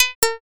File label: fishing bite